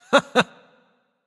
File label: laugh; smite; voicepack; lancelot